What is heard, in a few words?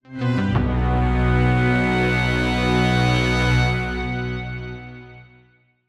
Stingers MISSION FAILED 120BPM